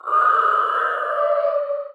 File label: Ghost Coming; Folk Hero